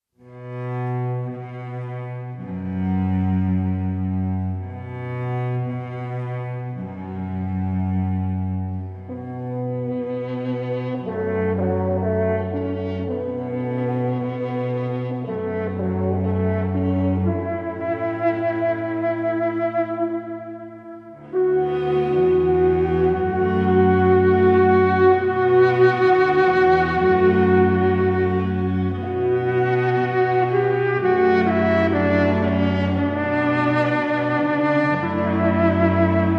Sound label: Carillon